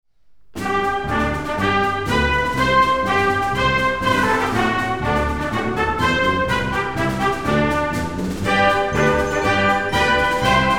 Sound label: IN BRASS; game music; super depth